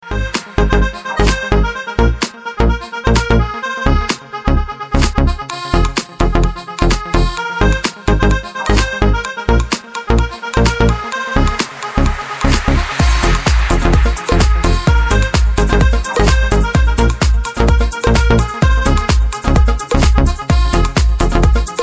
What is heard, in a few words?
Beat